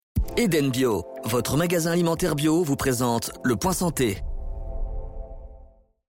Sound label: Jingle; Esperance FM; Eden Bio